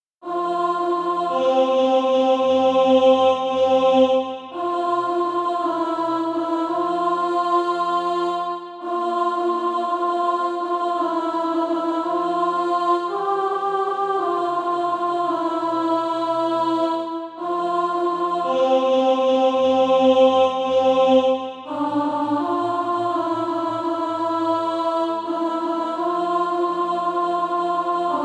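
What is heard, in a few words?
alto, SATB Practise Music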